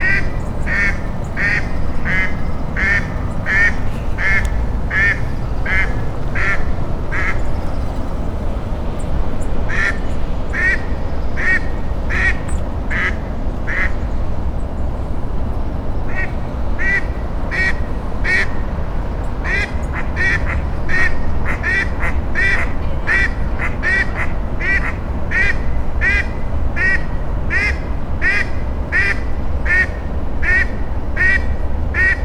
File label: ducks